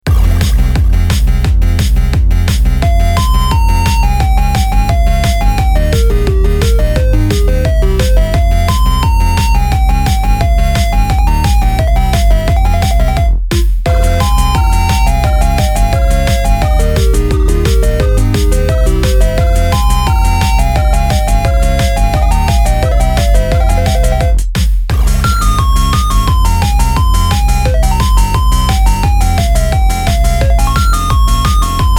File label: ending, bgm; asciinevrdie